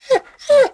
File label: dog whimper; animal; Tony Hawk's Project 8; XBOX